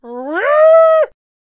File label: Attack Vocals